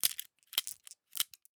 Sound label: 9mm rounds bullets gun metal